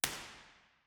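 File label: impulse response, St Andrews church; b-format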